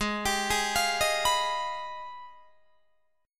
G sharp Minor Major 13th, Guitar DADGAD; G#mM13 arpeggiated

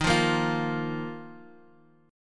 D sharp Suspended 2nd D sharp; chord shape

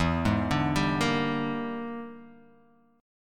E 7th Sharp 9th Flat 5th, chord

